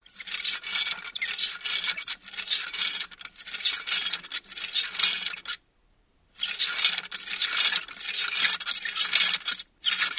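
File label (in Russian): скрип вращение металла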